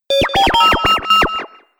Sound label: Droid Happy Bleeps, robot sound effects; Andrew Fleming; Twistedtracks